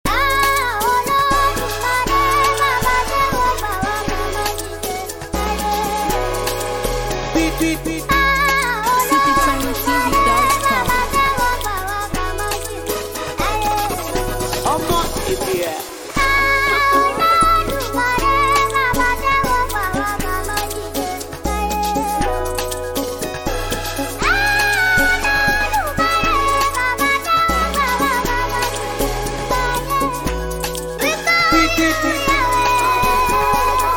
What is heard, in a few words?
Piano